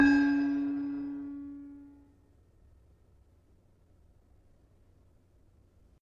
Demu 4 D, gravity harps tuned

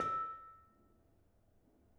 Bonang Panerus soft, gamelan

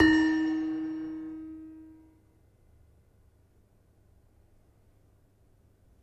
Demung, gamelan; gravityharps; timbrebox

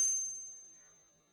Panerus 7C, gamelan tuned; gravityharps

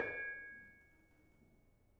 Bonang Panerus soft, gamelan

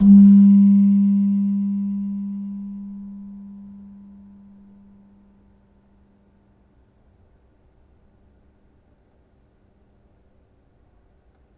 Slentem, gamelan

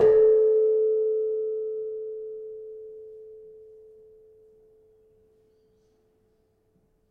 Kenong, gamelan

